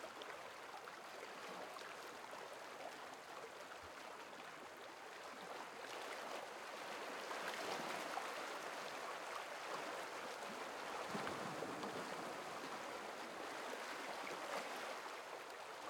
South Pacific, Exterior, Atmosphere, Desert Island sea wash light, distant crickets; Shutter Sound